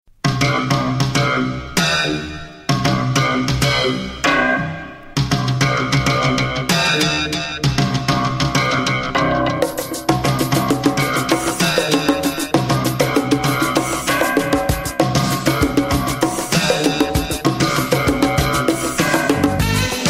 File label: yamaha dx7